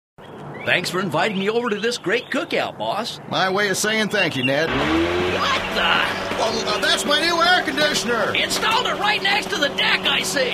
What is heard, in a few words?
Boss Barbeque